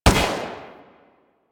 Rifle Shot; Weapons; Bard Rifle Prime Shot C; Warframe; Tenno

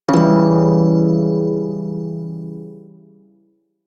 Prime Shawzin Phrygian Chord E; Music; Warframe

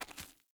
Walk Stone, Footsteps; Player; Mother

